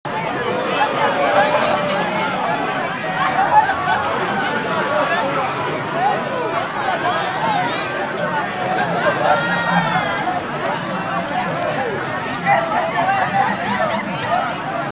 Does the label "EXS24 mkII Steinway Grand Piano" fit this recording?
No